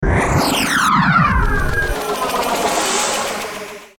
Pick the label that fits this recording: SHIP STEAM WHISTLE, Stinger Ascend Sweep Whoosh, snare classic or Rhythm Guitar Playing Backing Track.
Stinger Ascend Sweep Whoosh